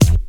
natural kick drum single hit f key; tuned drums f key

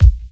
deep steel kick drum a sharp key; kick drum samples

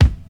good bass drum one shot c sharp key; kick drum samples

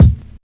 puffy bass drum sound d sharp key; Duration 0:01; Channels Mono; kick drum samples

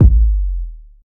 short reverb kick sample a key; kick drum samples

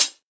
hot hi hat one shot D key; hits one shots; hat samples

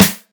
trebly acoustic snare g key; snare drum samples

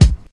rich highs kick drum sound a sharp key

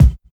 fat bass drum one shot C key

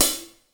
thin pedal hi hat sample D key; hits one shots; hat samples

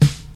Dry Steel Snare Drum D Key; snare drum samples

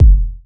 warm steel kick drum sample F sharp key

